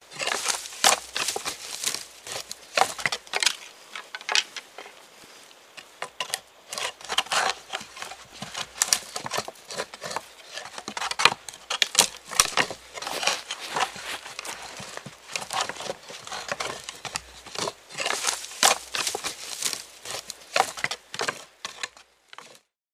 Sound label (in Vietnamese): tieng huou dung sung xe canh cay